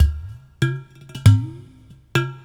Udu, Ethnic Percussion; Sonic Foundry (Sony Creative Software); Ambient Atmospheres & Rhythms

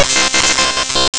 synth mania; ST-XX Amiga Soundtracker Sample Packs

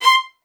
XST1 C6, LOUD VIOLINS; Q Up Arts; Denny Jaeger Master Violin Library

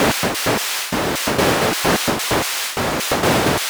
Gated Seq Noise, Gate Filtered 130BPM

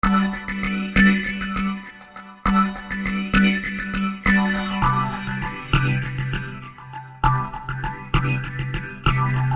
Keyboard, Soul

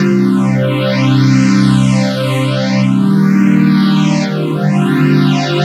Ober Poly, 85bpm, C, analogue circuit; musicradar

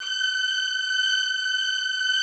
KURZ G#6; Greytsounds

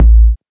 kicks; Panoramic; RoBKTA Sample Pack Supreme

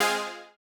Housey Stab G#3; KG MegaSaur

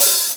HH OP, EMU Orbit-3; 300 Drum Machines

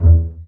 BA PIZZ E1, Orchestra; Best Service; Peter Siedlaczek's Advanced Orchestra

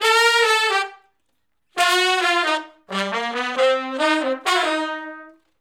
R&B Riff (C) unison, Horncraft; Sony Creative Software; Crimson Blue and Fabulous Horncraft 4 RnB; Horncraft for R&B; Sections

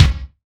Kick natural; Sonic Foundry (Sony Creative Software); Ugly Remnants VOL-2; Beatstuff

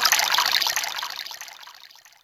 Stream; Yamaha DTXpress; Effect 1

